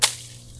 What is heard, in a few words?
foliage